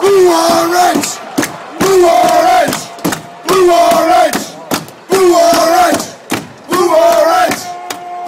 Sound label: blue orange, fan chants